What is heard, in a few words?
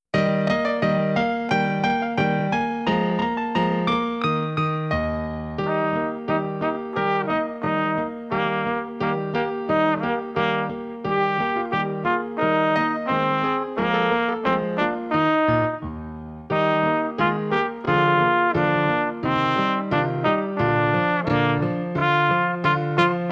Solo With Accompaniment (qn=88), trumpet; trumpet with piano; SOE Festival Solos Book 1; Mozart